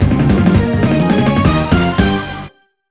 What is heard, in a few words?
Wireless Battle End [Success]